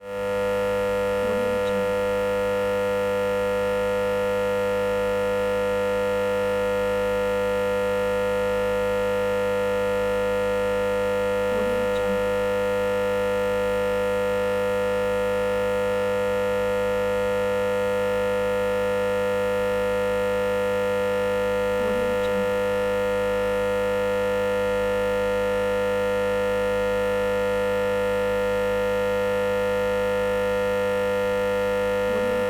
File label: generator